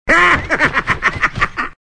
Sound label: laugh; cars; tractor tip; mater